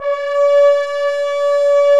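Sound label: French horn; Roland - LA Composer vol. 1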